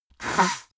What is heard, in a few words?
nose blow dry; koerpergeraeusche; menschen; soundpool